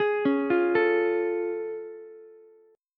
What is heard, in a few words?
A Augmented Major 7th A, Ukulele; arpeggiated; JGuitar